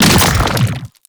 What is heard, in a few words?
Horrors Arm Spawn; Warframe; ManInTheWall; Octopede